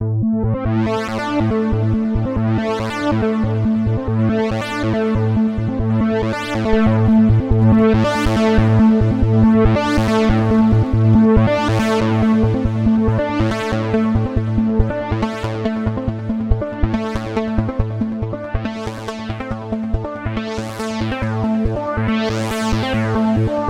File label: roland juno-106 sounds, arpeggio external fx